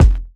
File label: smooth kick f sharp key, Loudest frequency: 191Hz